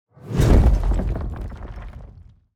Explosion Short Impact Break Gravel Reverb

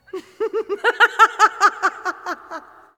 Woman Insane Laughter

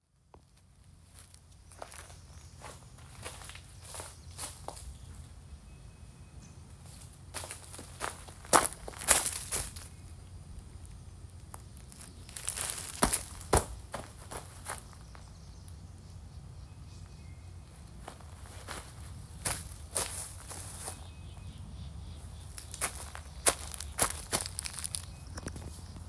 Footsteps In Grass

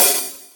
A Custom Hi Hat Cymbals Loose Hit; Zildjian

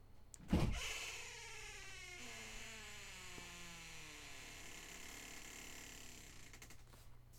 Door opening

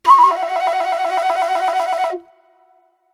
Kenacho Flute Indian

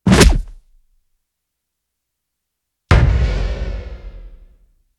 Hit Punch Crash Cartoon

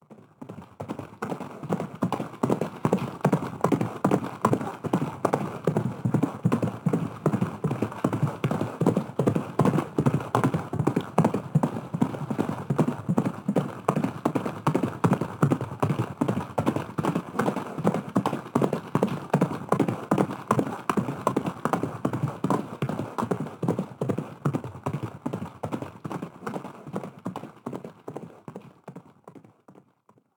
Horse Gallop

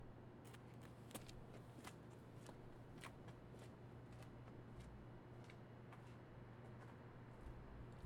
Walk Away Concrete

